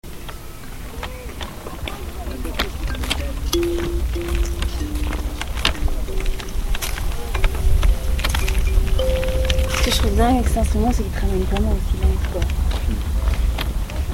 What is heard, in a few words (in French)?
L instrument qui ramene au silence